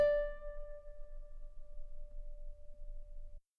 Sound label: guitar D5 very long piano normal; bananenkeyboard